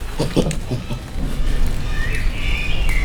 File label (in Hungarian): a párzás hangja, galléros pávián; pecsi zoo 2017